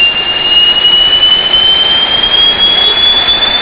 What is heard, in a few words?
nt5 high